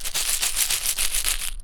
Shaking Box of Screws; BB Retail Therapy Sample Pack

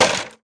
wood slam; wood metal SFX

